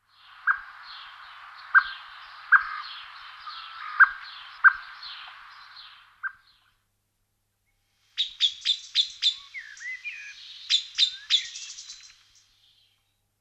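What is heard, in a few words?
Drozd chernii, Eurasian blackbird; ornitologiya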